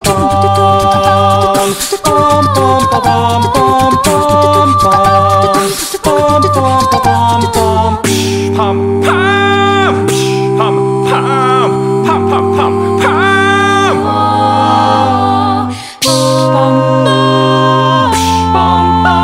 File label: Acapella; Avengers Theme